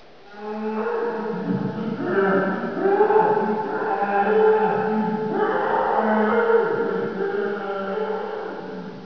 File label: scream; kz ice